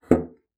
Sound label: BASSOON; Xsample VOL-6